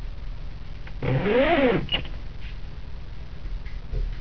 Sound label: zip